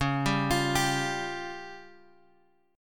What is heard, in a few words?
C sharp Major Flat 5th; C#Mb5 Chord {X 4 5 X 6 3} arpeggiated